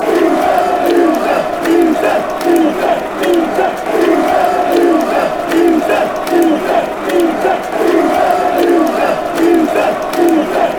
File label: easy, fanchants